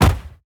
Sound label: soft impact